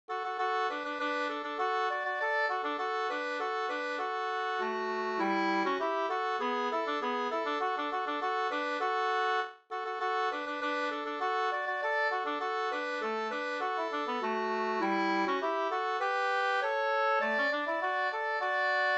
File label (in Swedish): polka, 2st